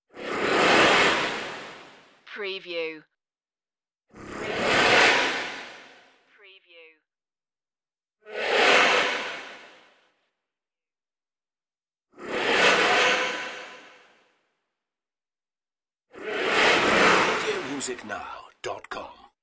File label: creature attack whooshes